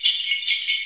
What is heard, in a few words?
Christmas Bells; Campane